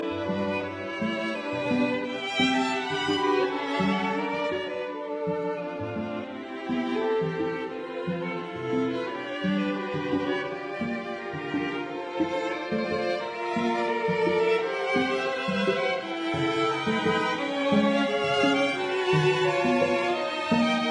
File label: String Quartet Allegro; Faure; in E minor Op 121